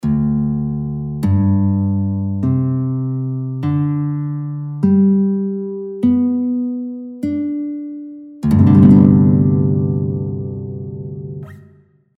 Hitara 7-str G-strij NAR Akord DGCDGBD; Класичний”, або звичайний стрій бандурки

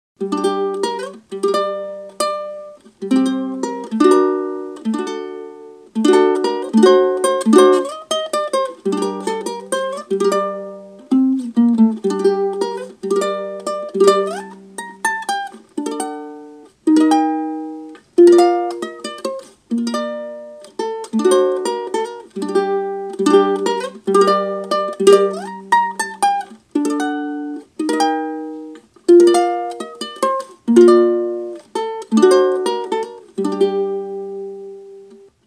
Bandurka Uke; Povij vitre na Vkrajinu; BugsGear Travel BL-BK

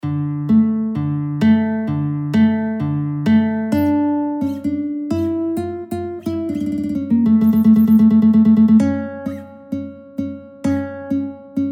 kobza; bandurka; VKushpet; Mamajivska kobza

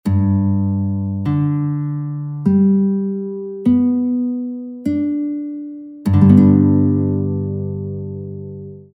BANDURKA

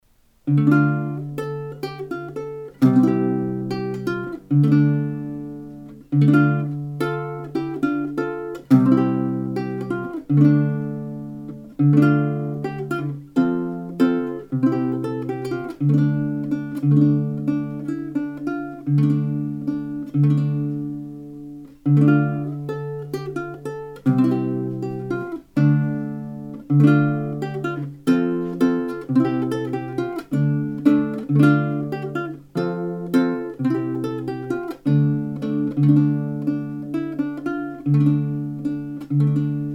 Bandurka Gitara; Floda BC-343N

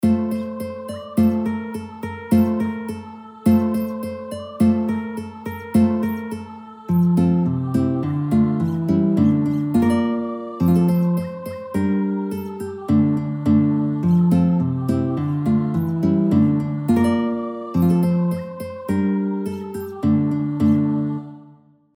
BANDURKA; Oj sydila chyrochka

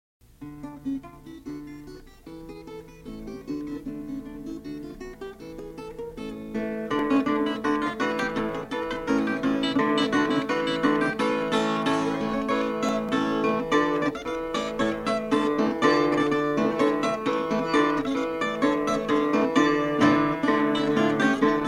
dudochka, z vjazanky tanciv; bandurka; Pavlo Konoplenko-Zaporozhec

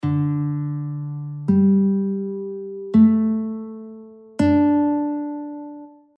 kobza; bandurka; VKushpet; Mamajivska kobza

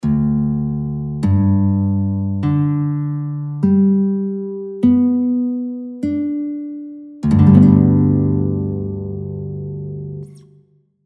Hitara 6-str G-strij Akord DGDGBD; Класичний”, або звичайний стрій бандурки

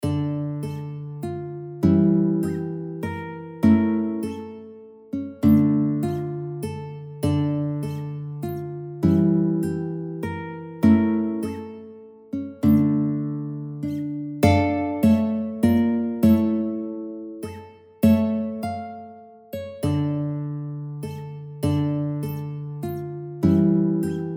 Dm BANDURKA; Vechir nadvori

